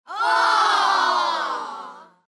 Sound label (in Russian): razocharovanie i udivlenie odnovremenno